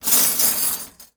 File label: Silverware Movement, Kitchen